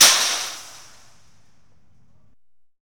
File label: THRASHY ME, Thrash Metal; SFX